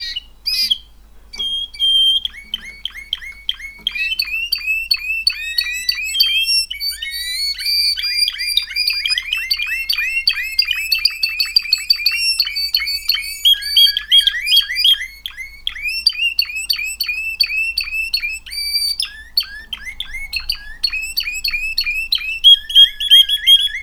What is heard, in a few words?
Duo, timbrado